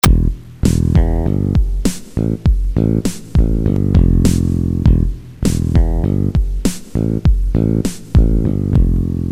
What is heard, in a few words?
Loop